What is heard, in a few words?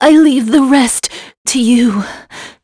Vox Dead; Veronica; kingsraid